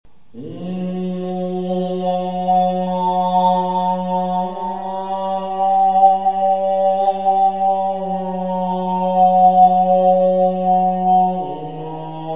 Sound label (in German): Gongmelodie